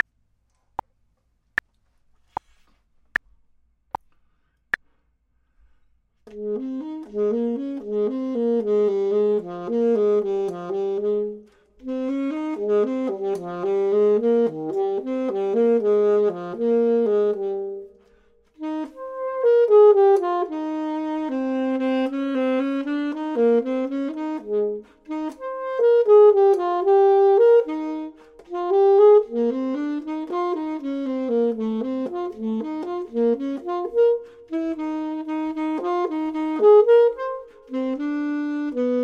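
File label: bottom, Saxophone and Clarinet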